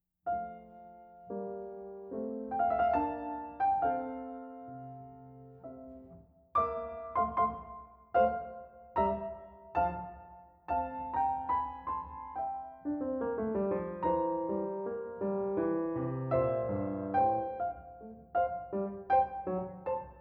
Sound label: Adagio, piano; Mozart